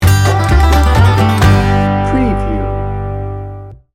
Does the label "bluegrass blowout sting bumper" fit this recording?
Yes